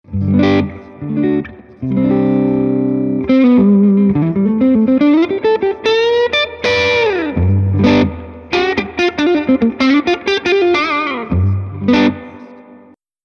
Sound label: reaper hp overdrive; lonestar